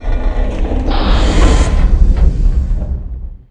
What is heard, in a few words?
large docking port; Barotrauma; barotrauma ek